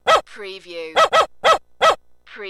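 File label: Dog Barking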